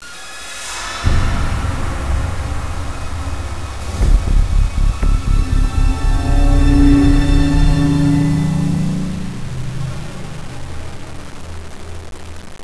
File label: tension